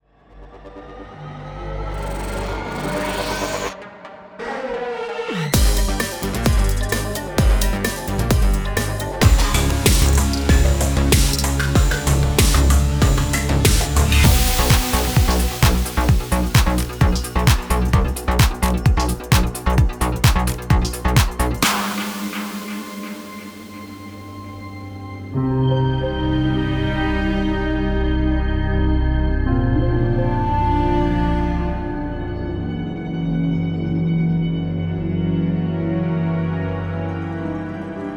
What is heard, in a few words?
Music